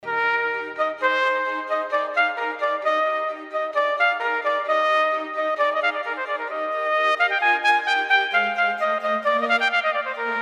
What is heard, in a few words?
Concert for Trumpet and Orchestra in Eb major; 3rd movement; F J Haydn